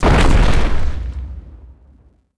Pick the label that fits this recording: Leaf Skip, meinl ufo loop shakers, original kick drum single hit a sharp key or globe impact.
globe impact